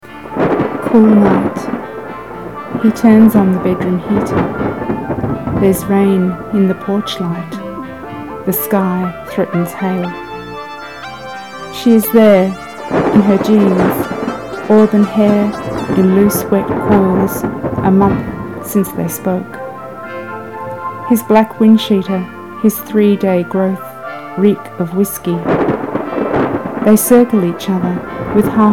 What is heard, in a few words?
Thunder and Hail